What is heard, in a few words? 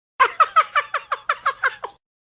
laughing; elmo